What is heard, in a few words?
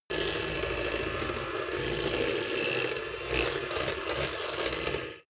electric hand mixer long